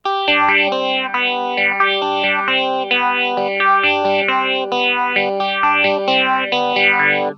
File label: ringy gtr tcxii re